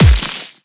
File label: death